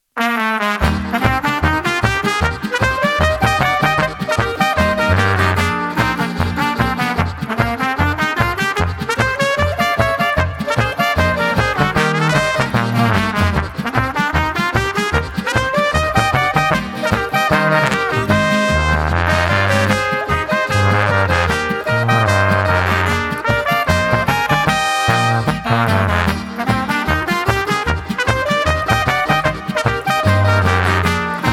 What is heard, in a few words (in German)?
Polka